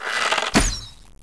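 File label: Bow, Attack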